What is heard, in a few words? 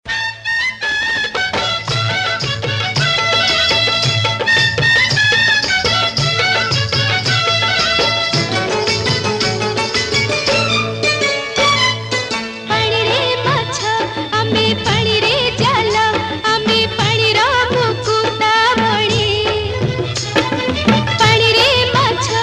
solo, Odia Song